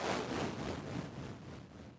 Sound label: highpass